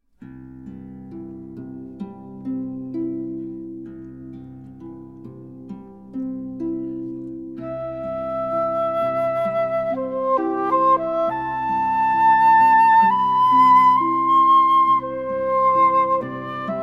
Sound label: harp